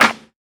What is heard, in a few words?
Warm Sounding Steel Snare Drum A# Key